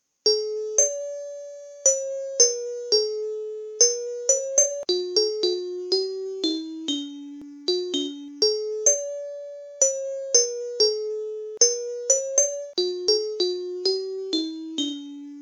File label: bluebells of scotland, custom chimes; chimecollector